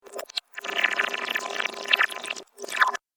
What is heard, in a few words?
Water - Backward Bubbles; Cartoon Sound Design Sound Effects; Andrew Fleming